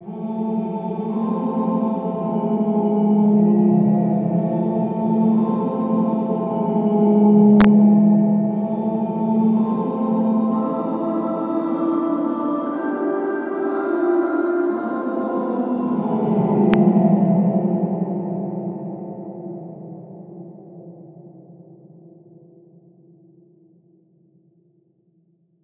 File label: Spoopy Music; Five Nights at Jimmys Online